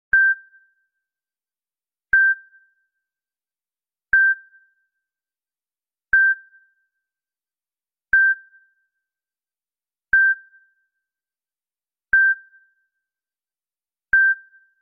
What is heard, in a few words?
Beep Once